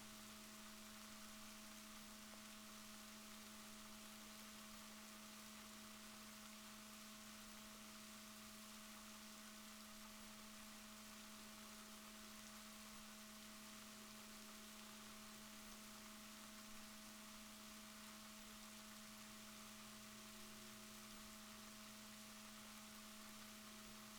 rorquals negatif, Balaenoptera Physalus Chile

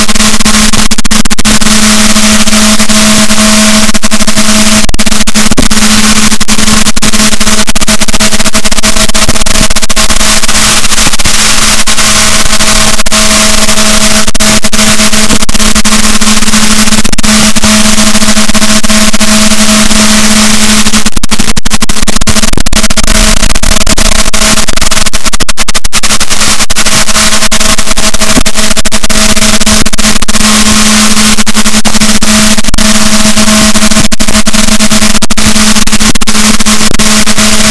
rorquals, Balaenoptera Physalus, boat

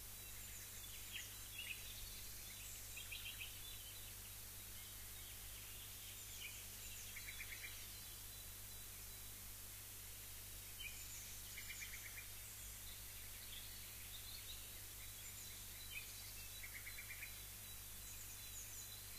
rayol farm; SEAMED

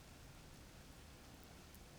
pulses, BALAENOPTERA; MONACO 2022-07

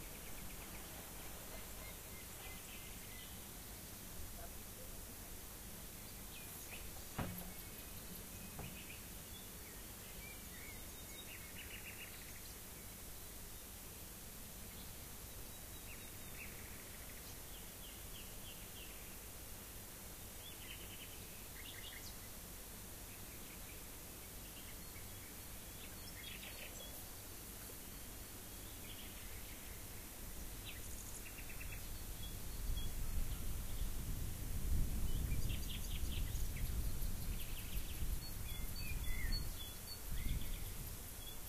rayol farm; SEAMED

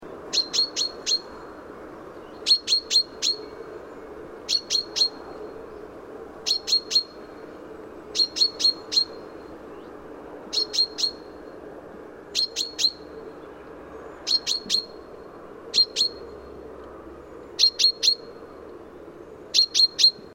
Fringilla coelebs; SETE

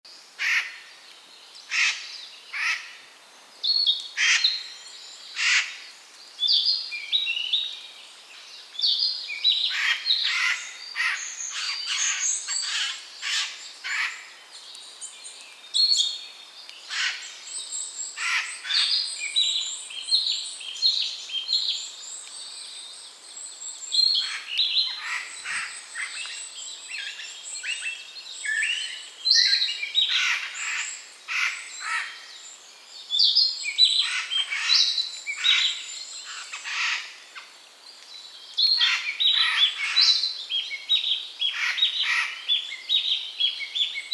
Garrulus glandarius; SETE

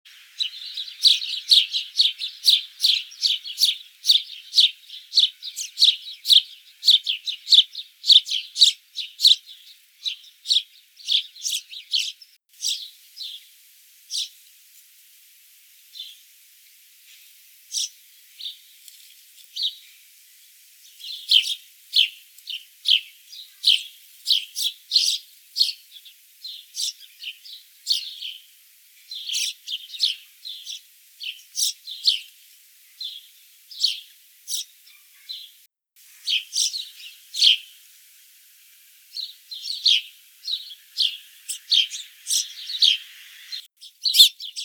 Passer domesticus; SETE

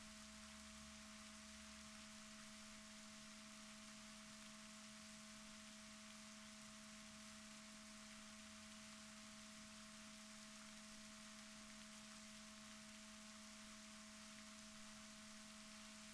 rorquals positif, Balaenoptera Physalus Chile

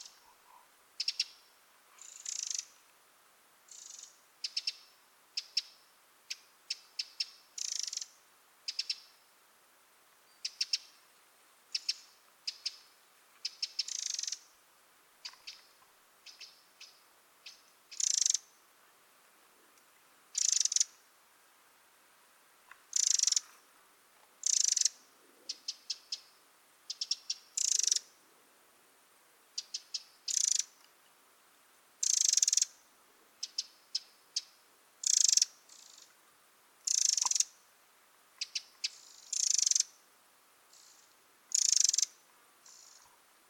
Troglodytes troglodytes; SETE

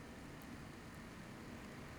pulses, BALAENOPTERA; MONACO 2022-07

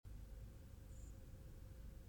Turdus merula; SETE

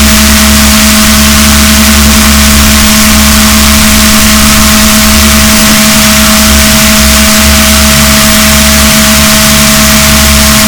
rorquals positif, boat, BalaenopteraPhysalus

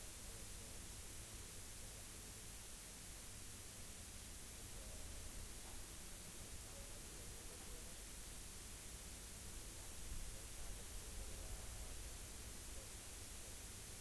rayol farm; SEAMED

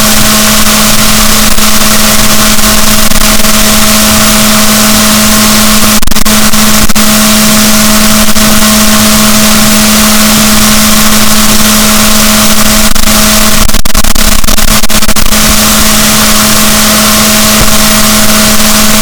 rorquals negatif, boat

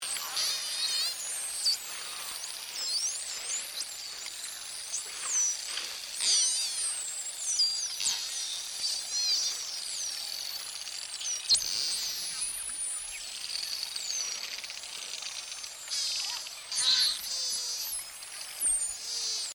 Common, Voices in the sea

